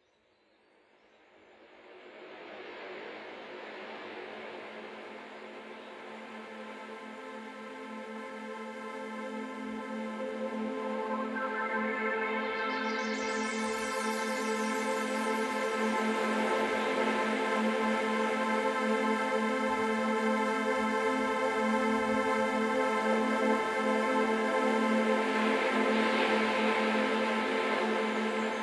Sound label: Piano